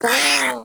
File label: Catbrow Feral Vocal Pain, Enemies; Warframe